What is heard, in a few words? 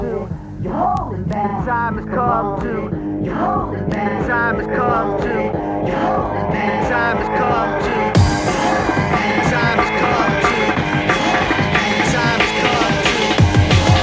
music, jailbreak; niftymaps